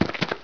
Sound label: dirt, player; cstrike